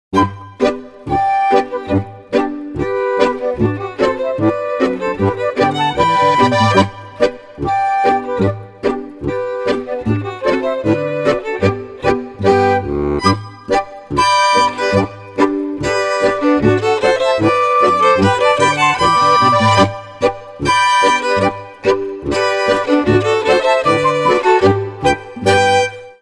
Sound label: Polka